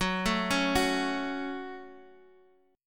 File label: F sharp Minor, guitar; chordshape; down